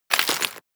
debris concrete, bullet impact debris

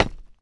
metal solid, footsteps

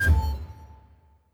player ping

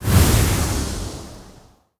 dash start, player, dash; Half Life Alyx

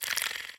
slobber, clams; infestation

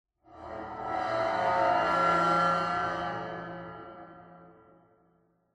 cymbal med; ambient; cymbals

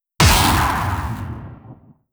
shockwave shoot layer

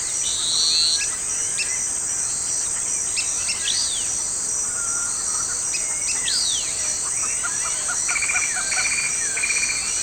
jungle exterior; aztec2; Counter Strike; CS:GO

